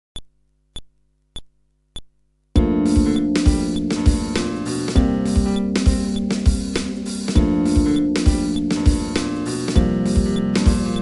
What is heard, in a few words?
Groove, fretless bass